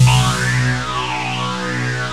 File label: ANALOG GIANT; OMI - Universe of Sounds